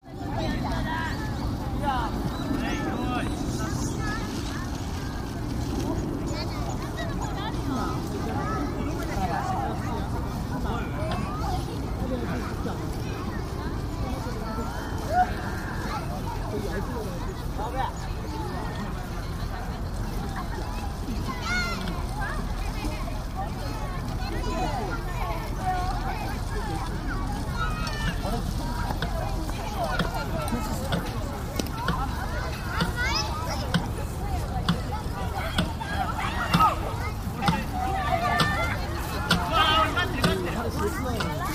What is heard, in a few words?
park